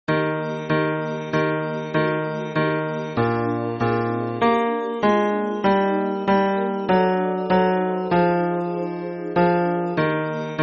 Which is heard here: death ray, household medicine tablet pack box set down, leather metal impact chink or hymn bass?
hymn bass